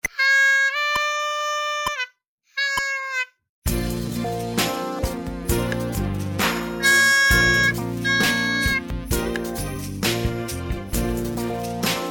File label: Dance